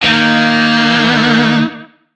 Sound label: Shawzin Chromatic Chord G; Music; DE; Warframe; Lizzie Shawzin